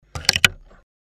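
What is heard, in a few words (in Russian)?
звук подъёма лапки швейной машины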